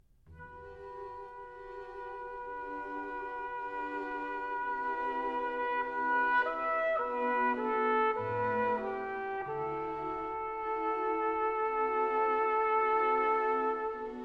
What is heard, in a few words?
Concerto for Trumpet and Strings, Lent et très calme